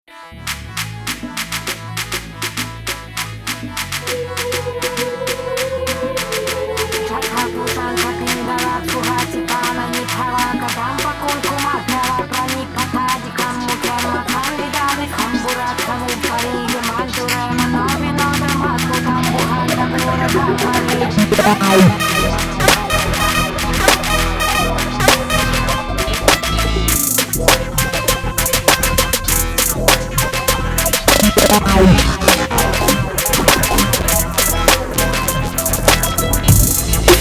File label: The main chop gently fades in and out of under a steady trap beat with an analogue hi-hat pattern; electro based synths and looped filtered chords